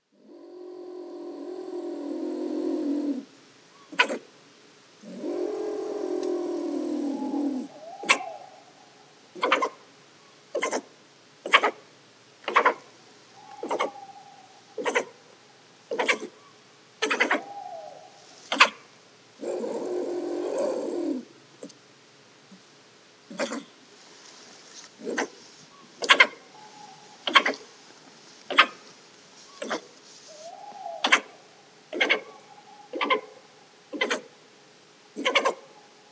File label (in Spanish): Martes foina, sonidos de la naturaleza; Gardu§a